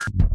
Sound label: coil; Sir Bombalot; 501 Great Games - Volume One; Guildhall Leisure Services